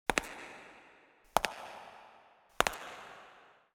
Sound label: marble footsteps loop